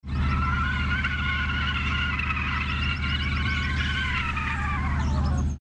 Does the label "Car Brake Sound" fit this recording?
Yes